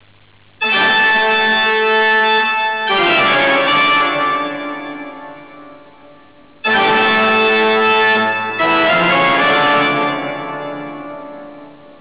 TOCCATA

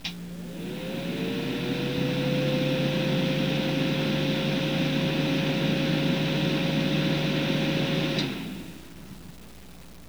BATH FAN

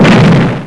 EXPLO; MEGARACE

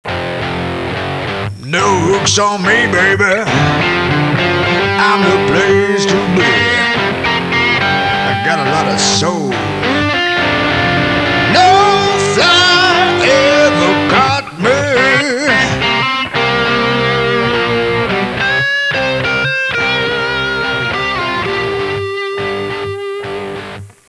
BLUES; FISHWISH

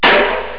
grilles; JOHNNY

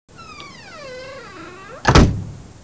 door sound; FRANK

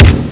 ROCKFALL

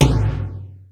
POP TOM; BEAT2000

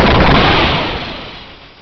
explode; alienfrenzy

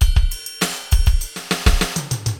FILLS, ROCK POP DRUM; MAGIX

